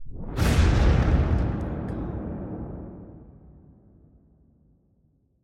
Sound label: anime explosion energy